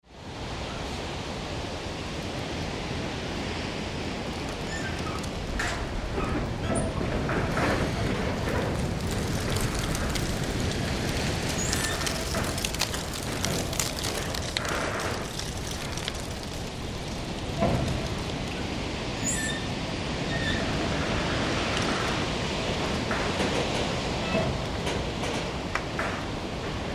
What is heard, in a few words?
Wind through Farm Outbuildings, Lots of metal creaks; Lots of metal creaks and dry leaves blown along